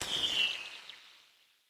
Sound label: Bow Corrupted Arrow By; Weapons; Enemy; Bows; Hunter Disguised Bow; Dendrit